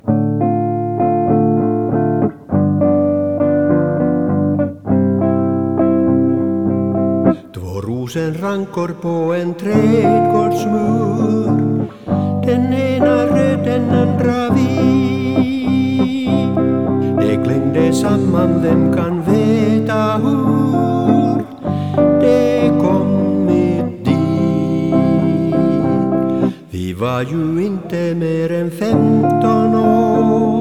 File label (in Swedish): tenor